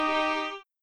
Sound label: Violin; backpack hero gamerip 2022